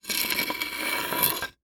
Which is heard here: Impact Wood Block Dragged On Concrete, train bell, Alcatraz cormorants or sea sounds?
Impact Wood Block Dragged On Concrete